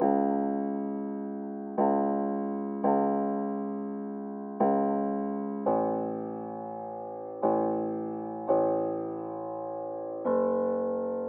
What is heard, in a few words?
170 BPM C# Min Piano; Melody Loops; Loop Stems; Cymatics; Anthem